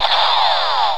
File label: FIRE